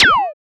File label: Laser